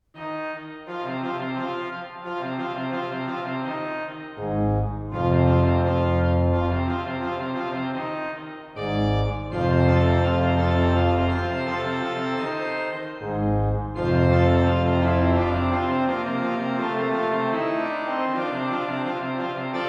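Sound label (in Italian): fanfare, organist; Fanfare RPS (2017); 2) Fanfara-fandango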